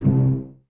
CES C Pizz, Cellos; Miroslav Vitous; String Ensembles

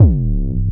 bass dropkick; Polyend; Recue

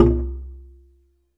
kalimba C2; Greytsounds; Scott Peers Sound Engineering

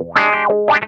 CROAKIN, Funk Guitar; Zero G - Funk Guitar

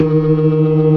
ORGAN, C3 NO PERC; Keith Emerson Signature Series

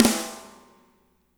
MED SNR, natural kit; Spectrasonics; Burning Grooves CD2

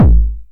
909 BD Tape Sat

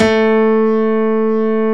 DIDI, FM BASS; AKAI S-Series CD-ROM Sound Library VOL-7

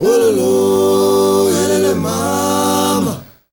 MALE GRP, M. CHOIRS, Heart of Africa; Spectrasonics; Heart of Africa 2

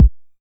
909 BD; Goldbaby Tape808 & Tape909; The Tape 909

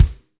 Kick, Alesis DM5

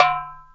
tik, pitch perc; East West - Global Sounds

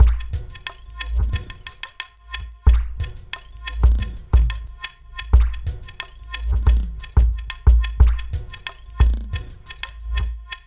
Ethnotronic, Processed Percussion; Sonic Foundry (Sony Creative Software); Rhythmicronics

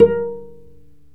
STR VC PIZ, Chamber Pizz, String Master Series

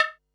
tom, Pearl SC-40 Toms

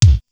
SDS2000 BD, Simmons SDS2000; SDS2000 Factory4

